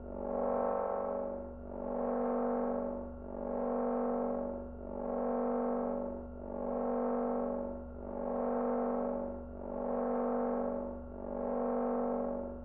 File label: Ui Loop; Insanely Twisted Shadow Planet